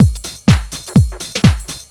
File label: swingdoor; Zero-G Deep House; 125bpm